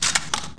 famas mag out, weapons; firearms

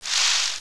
rustle

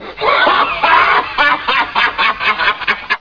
weird laugh; svencoop

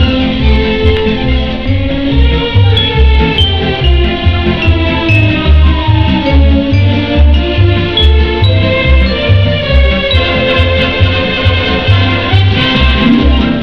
muzak

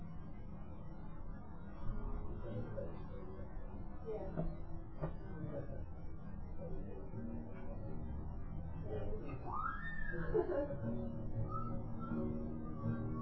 building noise; svencoop; soul run

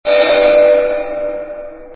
alarm; gearbox

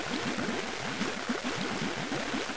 bubbles

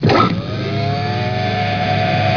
cryo start; zen

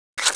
sg552 clip out, weapons; svencoop

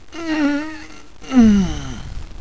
alpha die; svencoop; paranoia

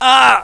pain; svencoop; paranoia; diversant

